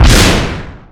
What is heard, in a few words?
Enemy Fashion 2nd Damage Shot; gamerip; Se Enemy Fashion 2nd Sp Damage Shot